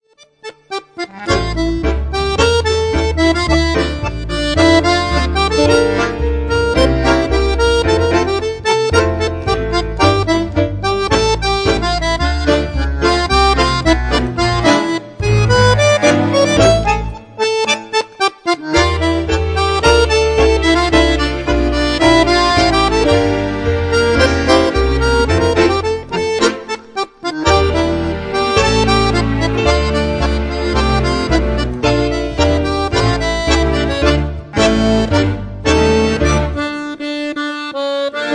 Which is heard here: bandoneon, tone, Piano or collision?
bandoneon